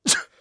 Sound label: hurt; qiangshou